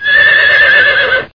Zebra; Wild Animal Racing

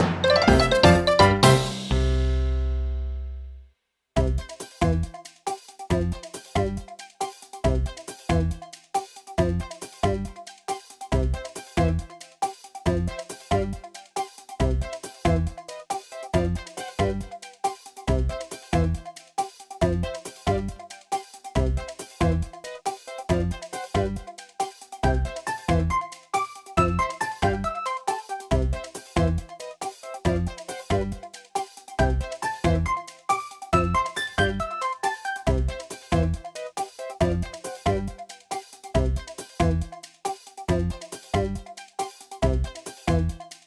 Battle Draw Jingle

